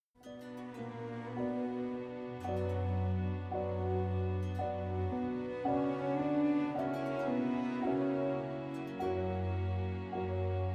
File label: Solo Concertos Keyboard; Bach Complete Solo Concertos; Sondeckis; Adagio e piano sempre; J.S.Bach Keyboard Concerto in D Major (BWV 1054)